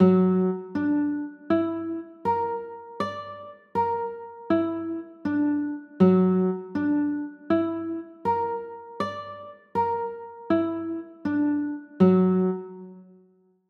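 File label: G flat 7 sharp 5 chord, guitar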